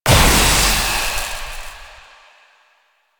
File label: Smoke Screen End; Warframe; Stalker